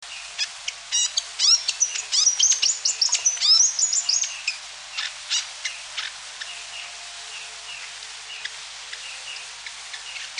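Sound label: Fantail, Bird Calls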